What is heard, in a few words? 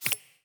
deck ui switch toggle on; steam; steamui